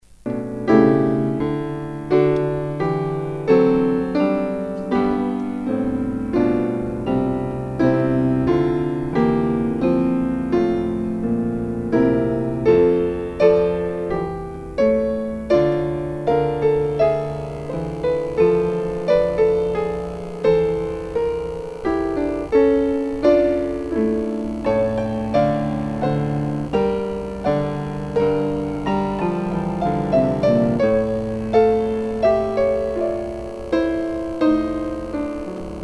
piano outro

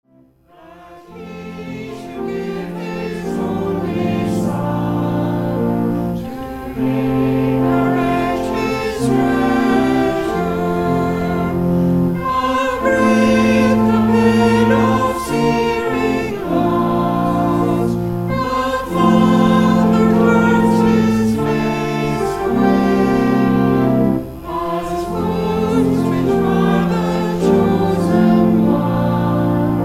hymn 549

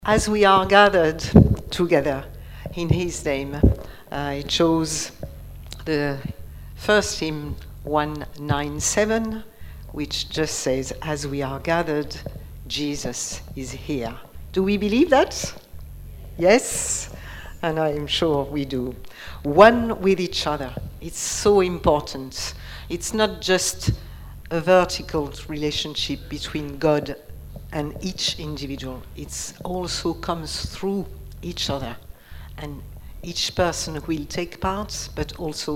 hymn; Hymn197 - As we are gathered Jesus is here

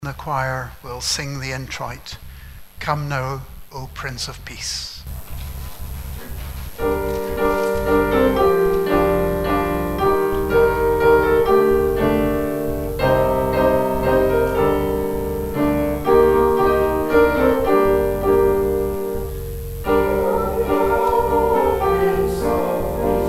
introit; Choir Introit

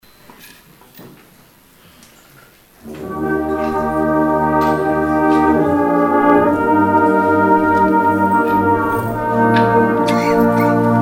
band interlude; Interlude during the collection; St. Mungo's

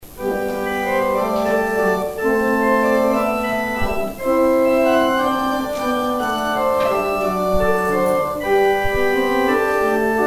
organ interlude

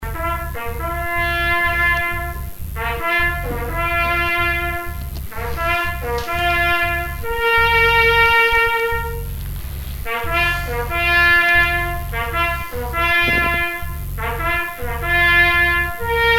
reveille; St. Mungo's

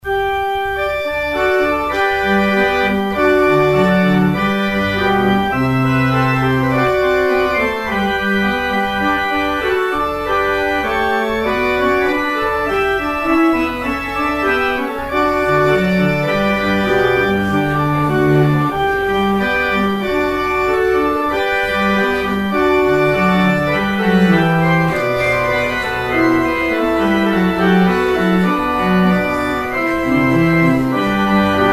organ outro; exit music; watchnight

